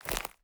Footstep Step Dry Grass Shrubs Pine Needles Meadow